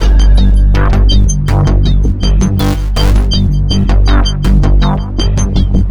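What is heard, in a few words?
Pop Texture Combo, Gmaj, 081 bpm; Digidesign; Pro Tools; Pro Tools Essential Loops; Pop Rock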